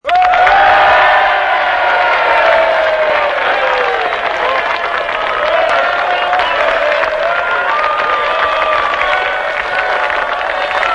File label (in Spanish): Aplausos de ganadores; graciosos